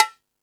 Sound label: Latin Layers, Drum Machines E-MU Pro-Cussion